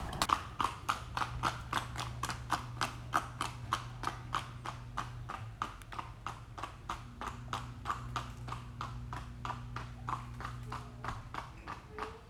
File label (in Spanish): Trote de un cabello